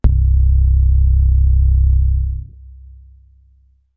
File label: Squelch, Synths BASS